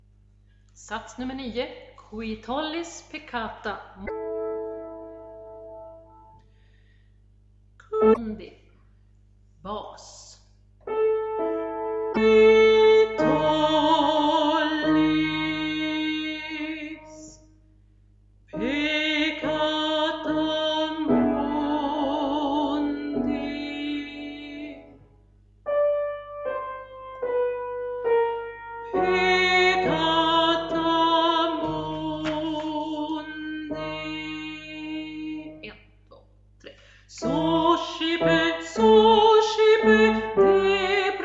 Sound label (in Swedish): bas; Gloria; Qui tollis